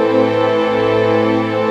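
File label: Pad A minor, Orchestral; Sonic Foundry (Sony Creative Software); Orchestral Series VOL-4 - Rock and Pop; Pads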